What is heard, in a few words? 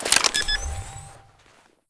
c4 start, weapons; cstrike